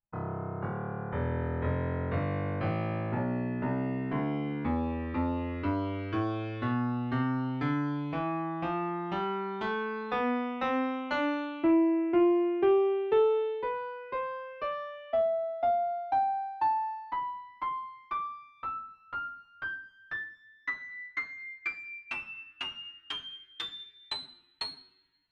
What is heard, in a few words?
scale spectmorph